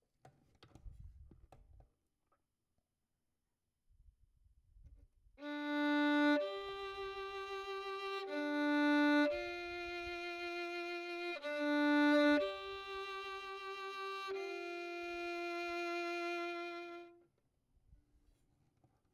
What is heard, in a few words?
Violin; Verdigris Music